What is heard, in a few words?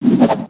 gun spin; weapons; aronwk collection; ndaudio